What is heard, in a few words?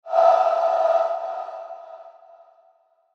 Whoosh Vibrato Pan; Blastwave